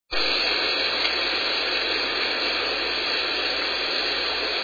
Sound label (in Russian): shum prirodi